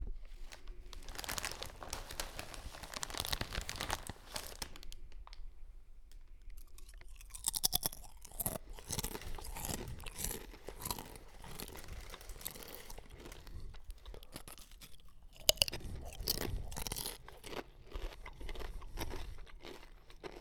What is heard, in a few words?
Eating Chips